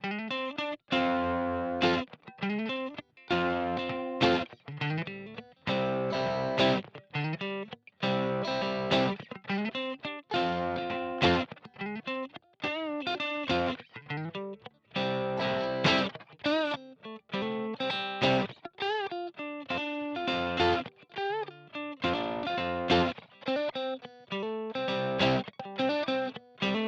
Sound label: Simplifier DLX Parallel AC Brit EL34 Clean Crunch